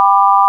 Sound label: sine; ものづくり基礎工学（情報工学分野）; 音の波形と分析